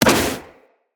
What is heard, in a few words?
Fire Arrow Impact